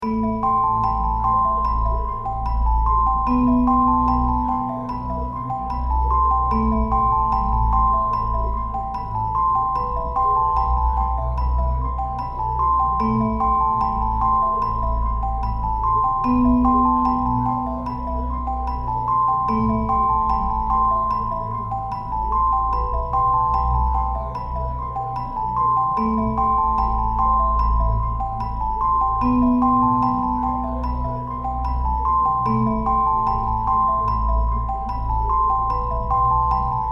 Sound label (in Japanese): 奇妙な事件; シネマチック